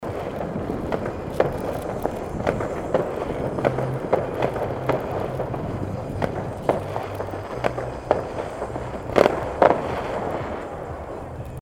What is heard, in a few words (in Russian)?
zvuk fejerverka